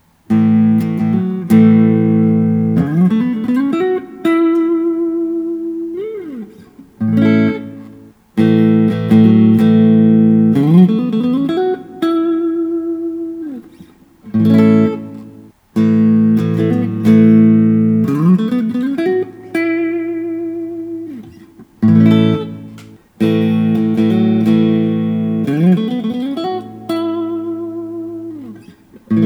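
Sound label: paul reed smith special 22 semi hollow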